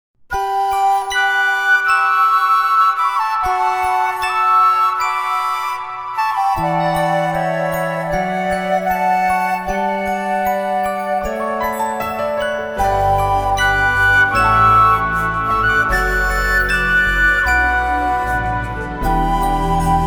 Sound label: Scene Music; Age of Wushu; Windows